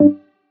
Synth Cuts; Vengeance Samples; VES1 Instruments

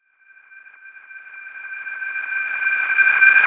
FX Alarm; Vengeance Samples

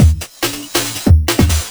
Breakbeat 140 BPM

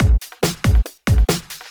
Loops BB 140, BreakBeats; Vengeance Samples

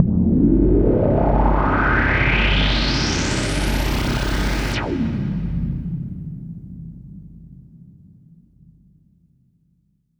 Uplifter

